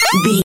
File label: retro collect pickup item